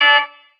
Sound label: chords